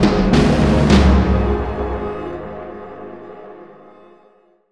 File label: Short Signal